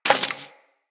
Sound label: Bullet Impact Debris Wood